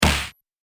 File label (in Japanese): 打撃